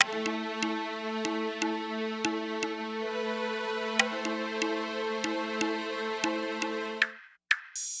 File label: sidewalk loop